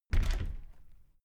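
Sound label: close regular handle, door; objekte; tueren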